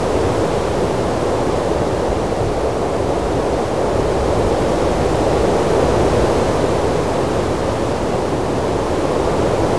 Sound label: tank; aAa maps